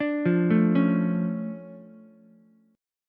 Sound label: D Minor Major 7th, D, Ukulele; DmM7 arpeggiated; JGuitar